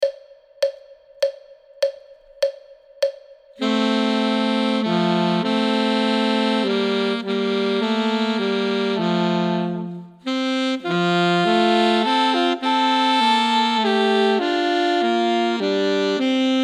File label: duet; Ten Easy Duets